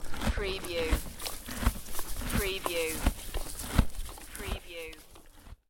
Horse eating munching hay (short)

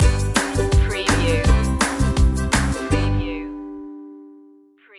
learning for life stinger

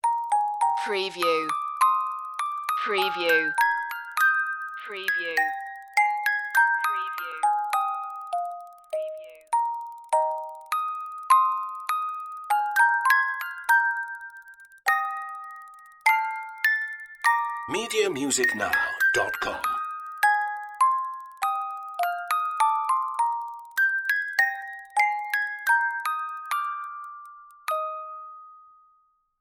musicbox

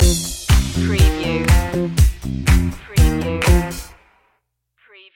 fly so high stinger

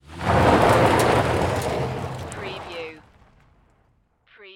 Auto Drive Off Gravel; sound effects bible

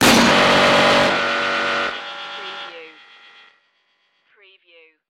power charge glitch

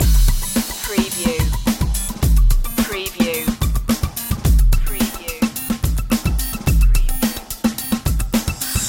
beat on fire loop

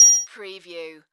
Multimedia Bell

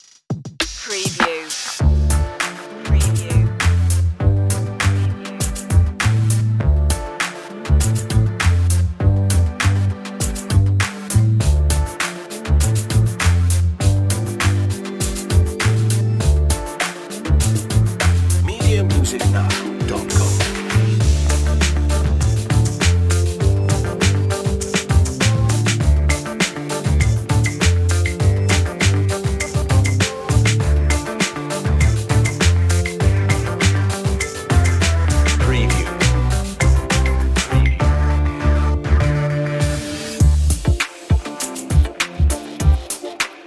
groovy quirky hip hop

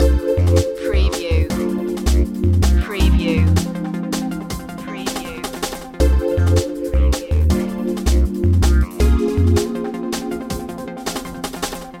town beat loop

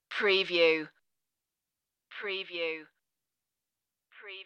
spring air stinger

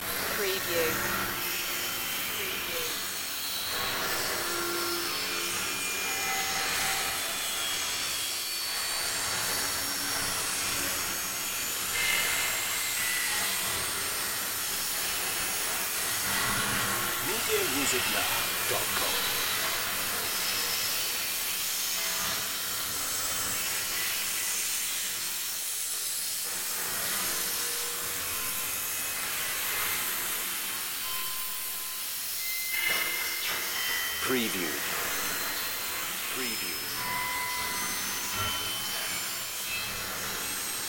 static sci-fi background loop

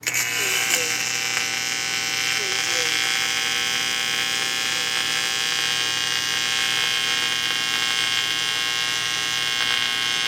Kick Welder On Run; Sound Effects Bible

